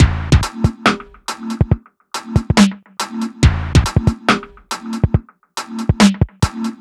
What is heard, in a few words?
140 drum loops; GrooveMachine; M8; Electronisounds